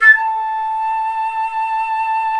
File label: FLT FL OCT, C Flute FX, C Flt OctAtk; Roland LCDP04 Orchestral Winds; 90 s Sample CDs